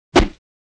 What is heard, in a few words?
swish single; crashes swishes impacts; Asian Show Sound Effects